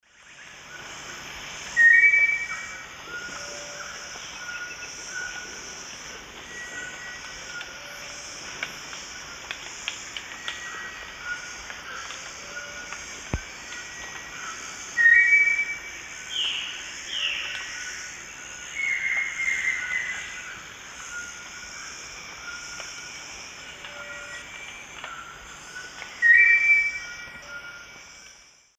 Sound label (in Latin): Eurypyga helias, song; S. America; Eastern Lowlands